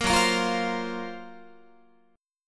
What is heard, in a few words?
F sharp Major 7th Flat 5th, down, Guitar; F#M7b5 strummed; 13,14,13,15,16,16; 4A,4E,5A,5D,5G#,6C#; chord shape